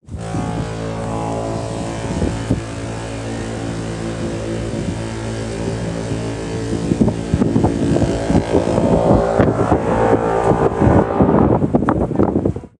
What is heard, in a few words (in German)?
historisches nebelhorn; queen mary 2; kreuzfahrtschiff